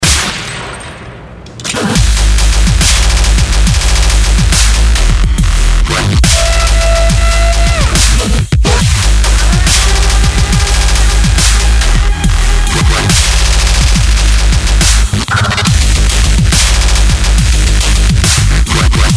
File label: machine gun; muzyka iz igry far cry 3